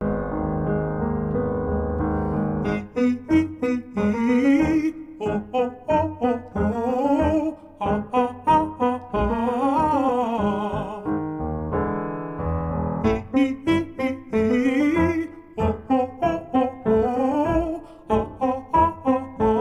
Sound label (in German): Warm up tief; Einsingen tiefe Stimmen; Stimmbildung